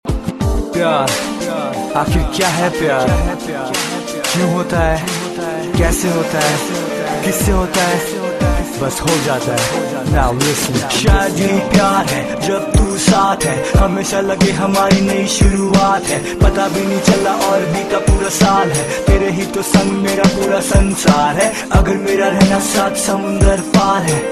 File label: rap mix; Indian